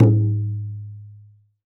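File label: BANYA left; WorldSounds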